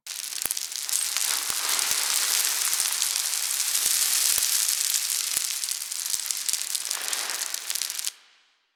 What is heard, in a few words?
Settlement Falling Sand Loop; Ambience; Warframe; Grineer Settlement